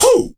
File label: Vocal Oneshots; Vengeance Samples; Vocals